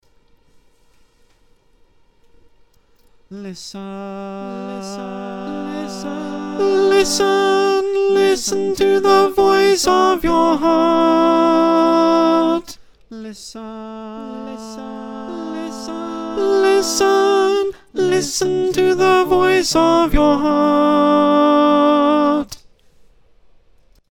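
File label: soprano, choir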